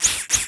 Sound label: dog servo; npc